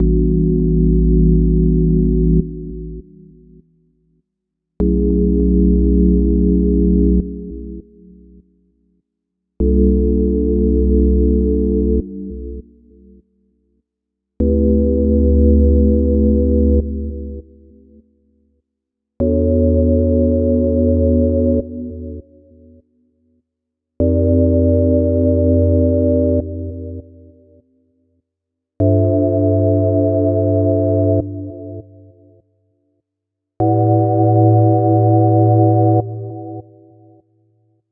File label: Organ Triads Cm Minor